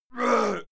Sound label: male fight pain short; schreie; menschen; soundpool